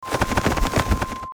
flap

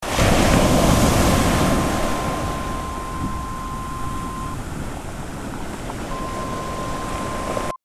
wave

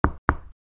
knock

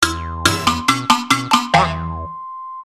lose

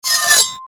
sword

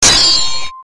sword

